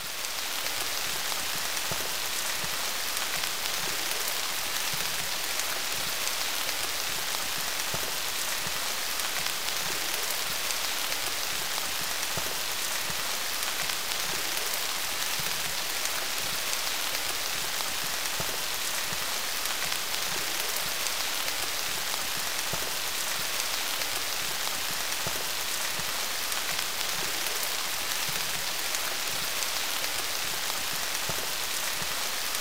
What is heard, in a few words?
Rain Sounds Relaxing Rain for Sleep; Nature